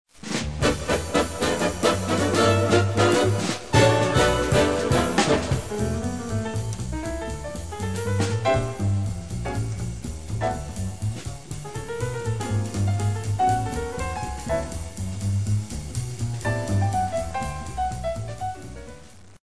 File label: swing big band